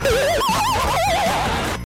goofy notification

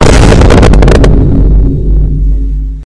vine boom bass

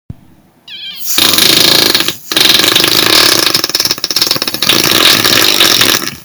insanely big huge fart

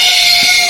ringtone

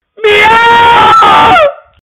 miau

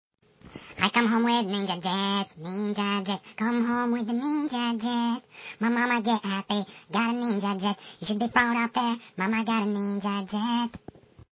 jet; ninja

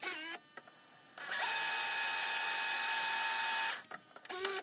fast forward

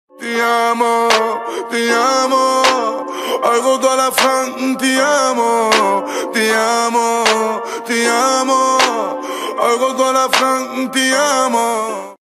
donation sound

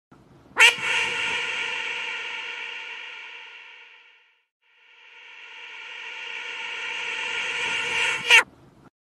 duck reverb reverse

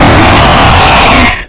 jumpscare; fnaf plushies 3 v4

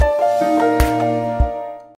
jingle; rbc

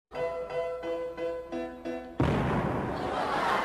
explosion

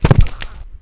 falling impact; tomb raider

